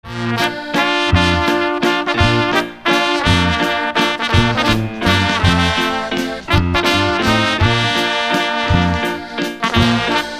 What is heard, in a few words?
Waltz